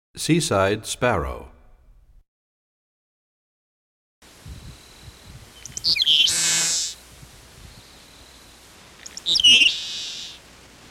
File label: Seaside Sparrow; Animals; Birds; 101BirdSongs